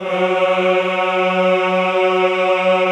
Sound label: CHO, Roland